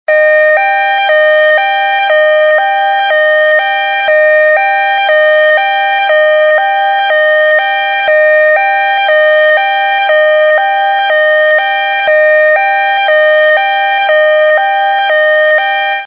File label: ambulance siren ringtone